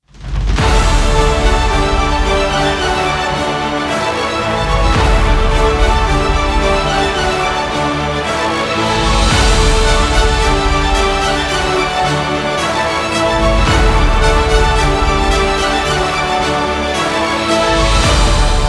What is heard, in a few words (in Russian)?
Фанфары замок